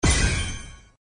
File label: coin burst